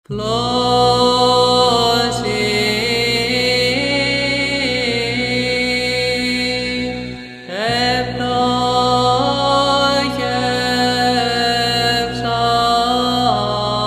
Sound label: Varys enarmonios, Psaltichie; Plousioi; Agios Antonios - Arizona; Agrypnia Agiou Antoniou - Arizona